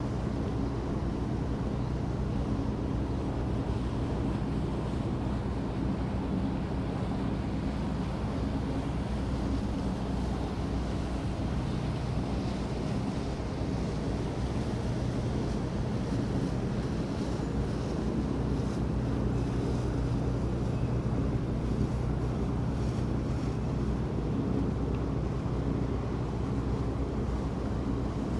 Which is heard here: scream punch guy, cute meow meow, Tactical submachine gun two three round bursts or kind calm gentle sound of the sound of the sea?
kind calm gentle sound of the sound of the sea